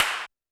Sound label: Snare, Roland TD-20